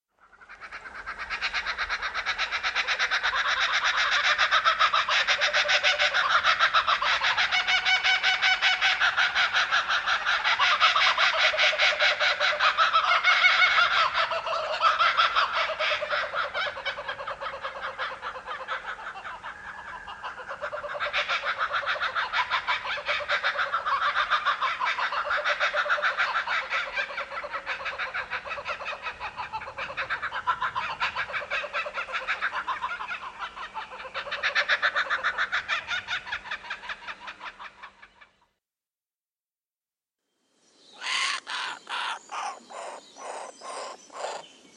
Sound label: Laughing Kookaburra